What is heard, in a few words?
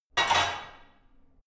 barbell only replace hard; sport freizeit; fitnessstudio